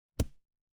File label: impact punch